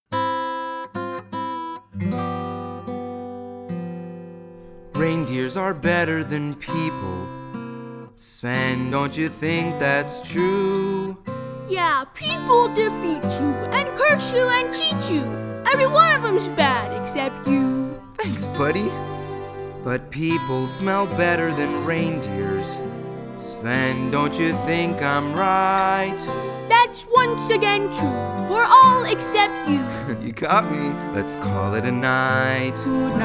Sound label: audition vocals